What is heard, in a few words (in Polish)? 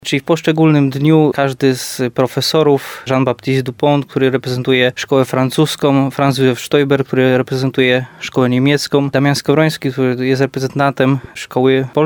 organy; w nowym saczu